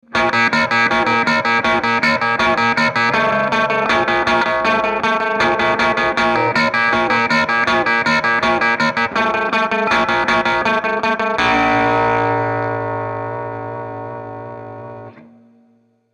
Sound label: MF Ring Only Ring